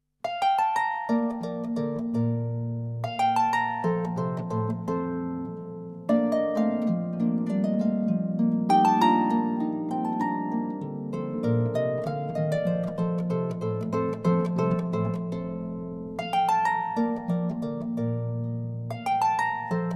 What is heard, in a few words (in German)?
Konzert B Dur Andante allegro; advent